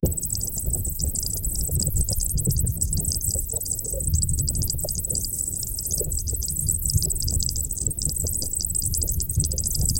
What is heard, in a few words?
misterious zoom sound; mystical